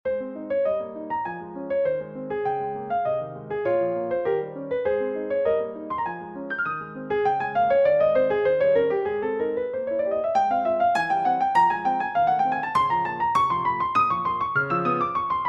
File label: Piano; Three Pieces for Piano I Piacevole